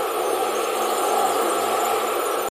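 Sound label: free spins outro ambience; wild worlds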